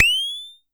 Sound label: Pollard Syndrum